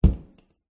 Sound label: household door cupboard built in close room ambience